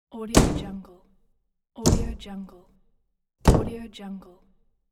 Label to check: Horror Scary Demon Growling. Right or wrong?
Wrong